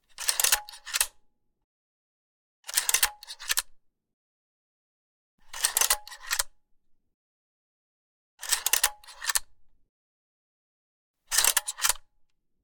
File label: camera kodak coloursnap 35 film advance lever fast mono; SoundHolder; Cameras